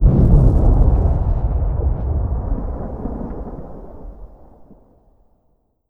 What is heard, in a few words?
city battle